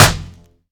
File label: small reverb snare drum f key; snare drum samples